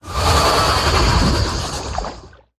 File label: Coilfang Constrictor Death